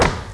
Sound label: WHACK; Heroine Iysayana Trilogy